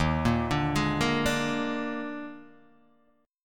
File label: Eb Major 7th Suspended 4th Eb, Guitar, Open D